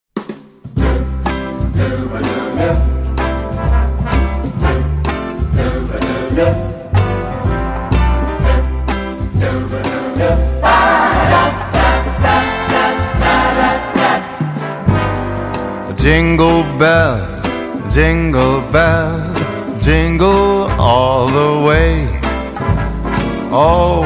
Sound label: Jingle Bells